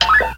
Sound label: keypress